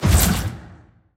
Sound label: black hole raise